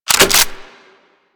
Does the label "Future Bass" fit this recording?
No